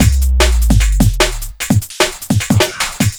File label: Drum Loops, 150; JungleWanton; M8; Electronisounds